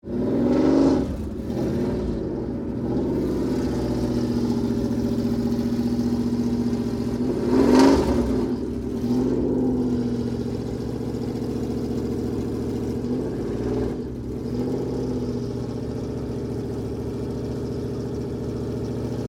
Dragster Move, Sports, Dragster, Move, Into, Position, Revs; Race Cars; Blast Wave